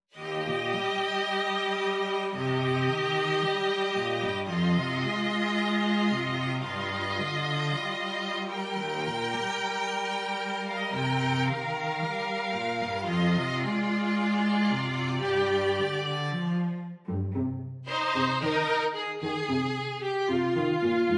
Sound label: String Duo